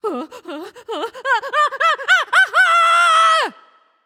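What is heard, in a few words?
fear, female voice; smite; voicepack; hera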